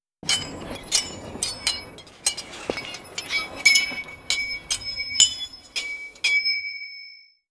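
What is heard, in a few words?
epic battlefield preparation, warfare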